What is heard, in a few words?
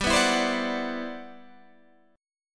C Major Sharp 11th G